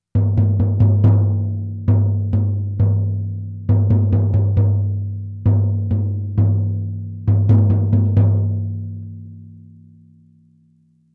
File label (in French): Surdo contra samba reggae a 2 battes